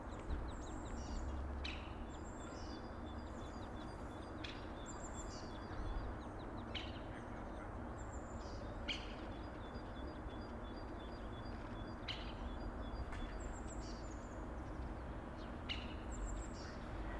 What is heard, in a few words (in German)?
blutspecht